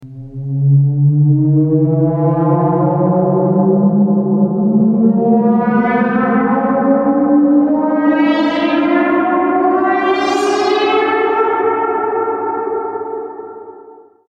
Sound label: The Sting Of Bleech, Tention Makers; RoosterFX